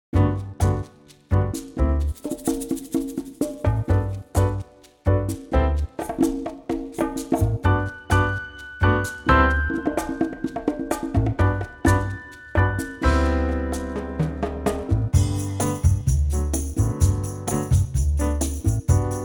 G bossa; key - G - vocal range - D to D

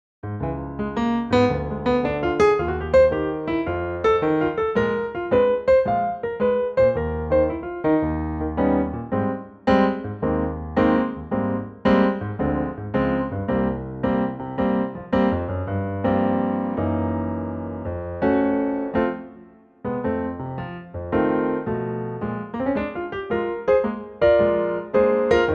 Ab piano; key - Ab - vocal range - C to Db; Cool and lightly swinging 2024 Pianotrak arrangement

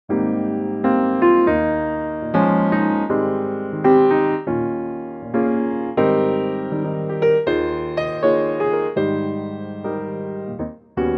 Ab piano; key - Ab - vocal range - Eb to Ab; Superb piano only arrangement, at a great tempo, of this hugely popular old standard. Piano and vocals

